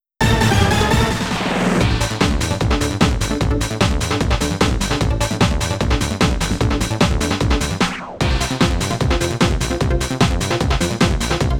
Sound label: Complete; option tuning car battle spec r original sound track